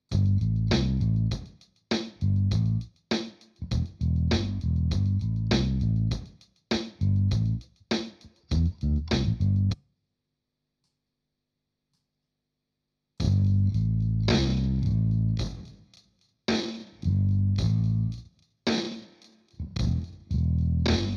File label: Digitech JamMan Time Streching